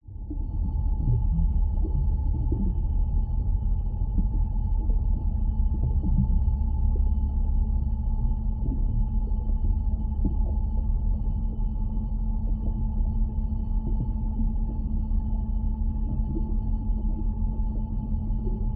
submarine small personal onboard ambience underwater